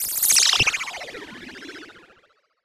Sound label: shooting star